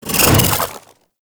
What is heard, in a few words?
Jump; Warframe; Corpus; Arachnoid Wraith; Abilities